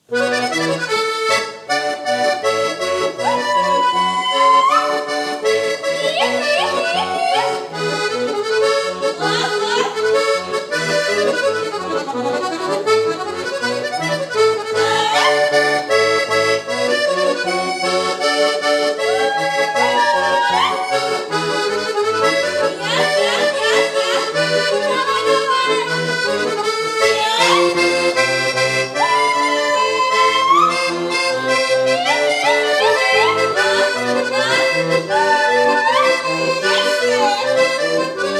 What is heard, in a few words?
garmoshka no vocal